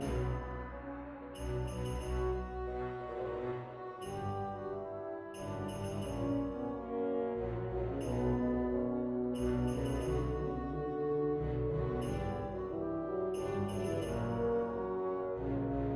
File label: encounter hostile nords, music; Mount&Blade Warband